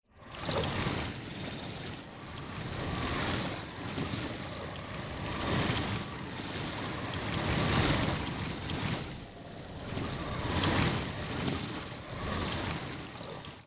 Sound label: Wisp Water